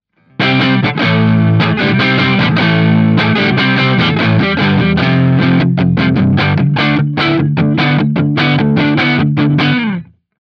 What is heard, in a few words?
rock; ampvergleich